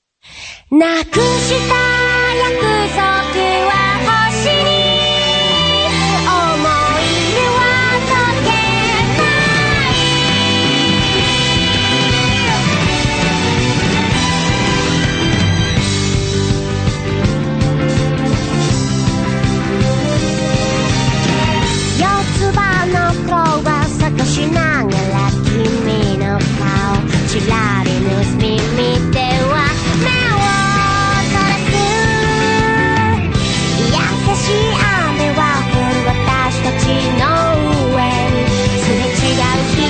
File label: dramatic tv